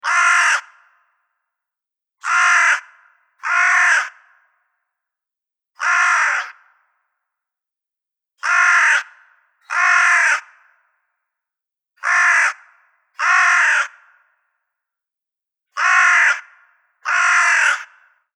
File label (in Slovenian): Krokar